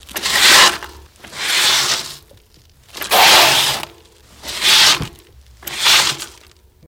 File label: Bike Tire Skids